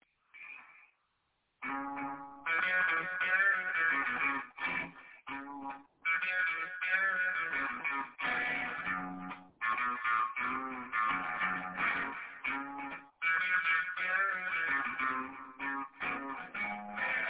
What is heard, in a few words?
echoey blues